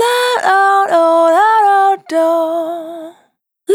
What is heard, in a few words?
Vapor Dry, Single Voice Melodic Loops